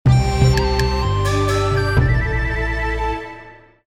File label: game win; ChangChunMJ